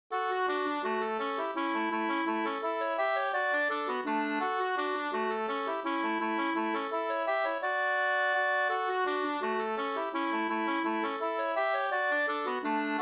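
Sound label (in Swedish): vals, nyckelharpa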